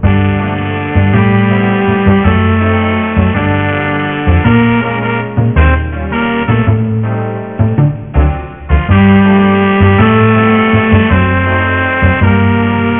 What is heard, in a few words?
jazz; startrek